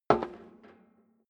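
step dress boots metal rattle single left, footsteps; schritte; menschen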